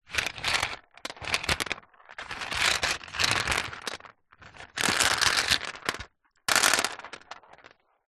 leisure toy bingo balls on plastic rack handling movements; zapsplat